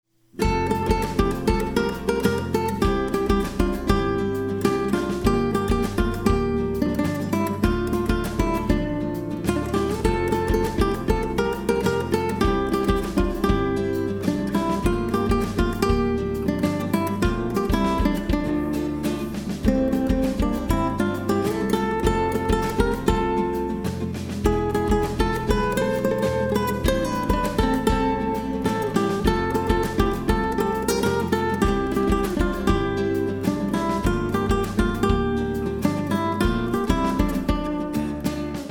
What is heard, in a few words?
Pop solo